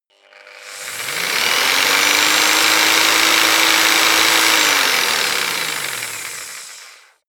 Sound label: jigsaw engine medium slow long